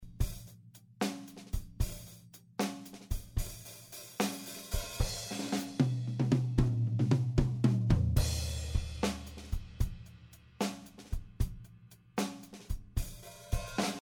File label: drums